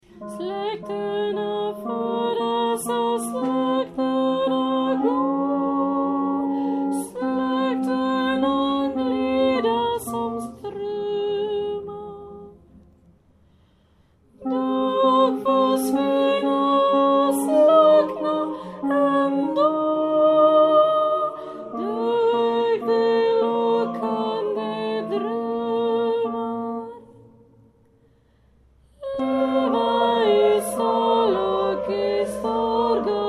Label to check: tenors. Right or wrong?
Right